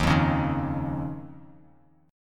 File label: D sharp 7th Suspended 2nd, Bass Standard; strummed; JGuitar